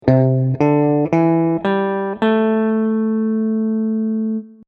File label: major pentatonic